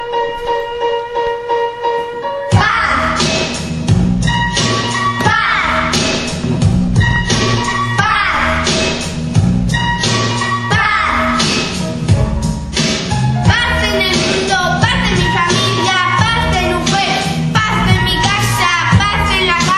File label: RAP